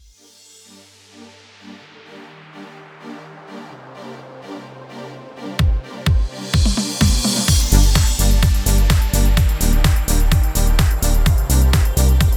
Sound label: Patter